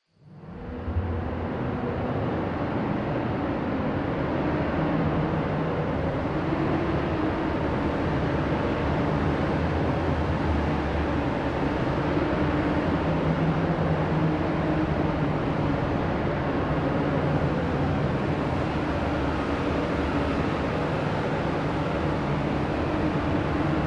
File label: Howling Artic Winds; Environmental Sound Effects